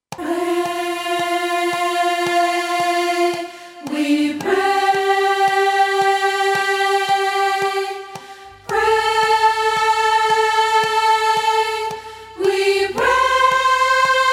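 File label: prayer for peace soprano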